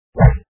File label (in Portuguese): mago; anacroz